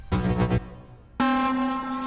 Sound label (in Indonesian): Nada Dering Circle